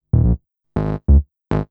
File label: TECHNO BASS 140 A